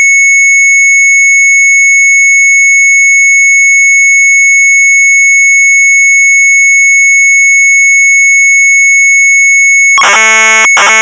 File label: MZ800 Tape OPENING; Sharp